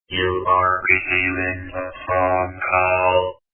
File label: Robot Phone Call